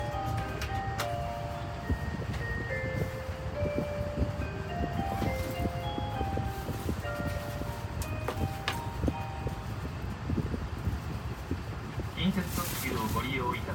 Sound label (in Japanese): 近鉄大阪線阿倍野橋東, train sounds